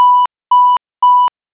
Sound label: ui ptt busy; ui sounds